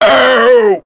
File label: pain; awesomenauts; Smiles